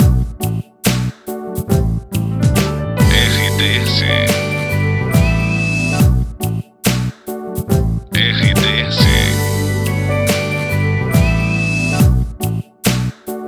trilha para locução; Santos FM

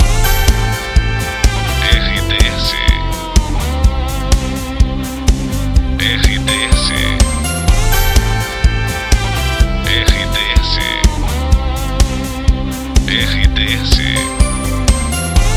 TRILHA PARA LOCUCAO

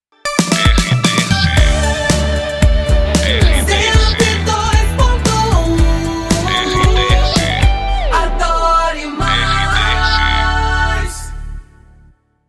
PASSAGEM

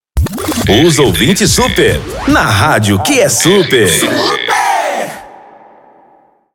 intercom

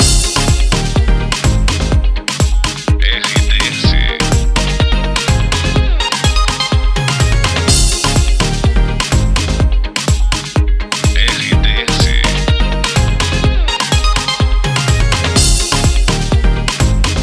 TRILHA PARA LOCUCAO FM; 107 FM

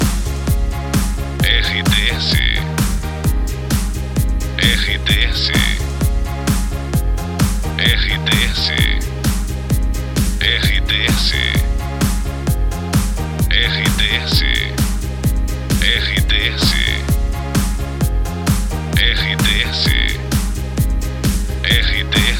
TRILHA PARA LOCUCAO WEB NET JOVEM

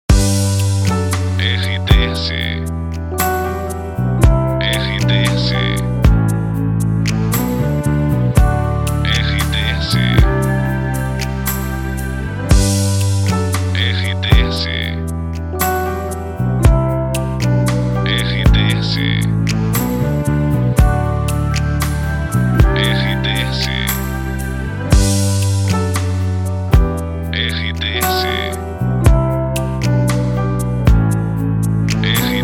TRILHA PARA LOCUCAO; Pacote Web Net; Web Rádios

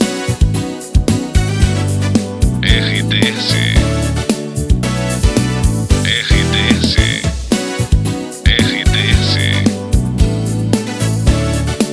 TRILHA PARA LOCUCAO WEB NET POPULAR

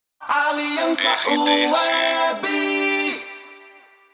ACAPELA; Aliança Web

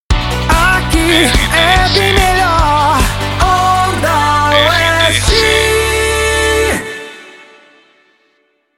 passagem; Pacote Onda Oeste FM, Piumhi/MG. uma estação de rádio pop rock

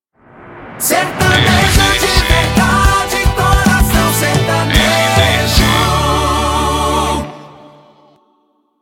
passagem coração sertanejo; vinhetas cantadas para programas

